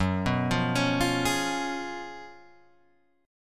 Gb Major 7th Suspended 2nd Suspended 4th Guitar Open G